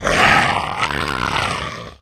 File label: zombie growl, hunter voice idle; player; source engine; left 4 dead 2